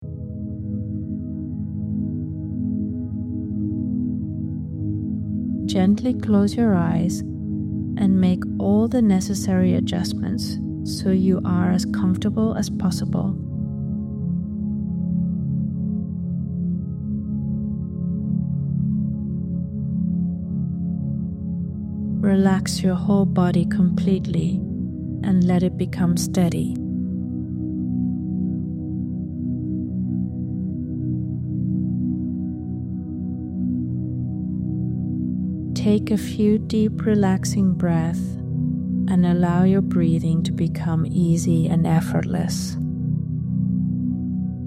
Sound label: Deep Relaxation Journey Music